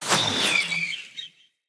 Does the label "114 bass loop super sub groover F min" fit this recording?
No